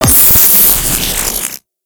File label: Zariman Corruption Pickup Hostile Large; Warframe; Missions